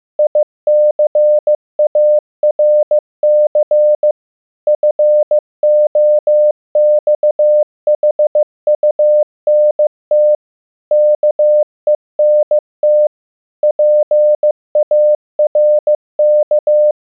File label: CW